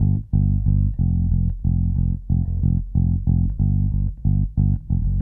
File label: Call And Response Bass B, 70s Analog Funk; Sonic Foundry (Sony Creative Software); What it Is!